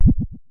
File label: Synare Filter; Star Instruments Synare 3